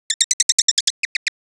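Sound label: weak response call, Nashoerner; Kommunikationslaute; emotionale Rufe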